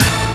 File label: DANCE HIT; E-MU Formula 4000 Series Vol. 1; Hip Hop Nation; Raw Phatt